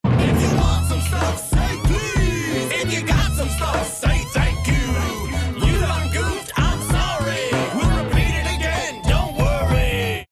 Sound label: movement ringtone; manners